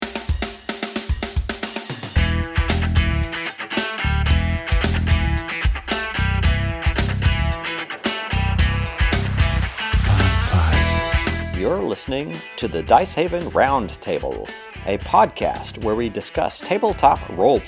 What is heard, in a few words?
intro funk; Dicehaven